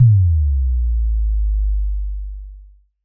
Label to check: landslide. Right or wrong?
Wrong